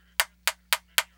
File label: tap pen notebook; kontakt